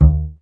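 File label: STR CBS, Solo Instruments, double bass; Miroslav Vitous; Solo Instruments VOL-2; Solo lnst. II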